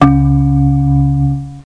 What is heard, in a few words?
Bong; Cloudz 1.0.0